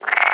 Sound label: frog; World of Tanks Blitz